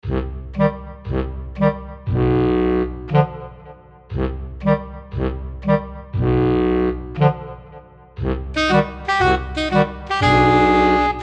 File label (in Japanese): 怪しい屋敷を探索する的なBGM; DOVA